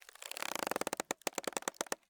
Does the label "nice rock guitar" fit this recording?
No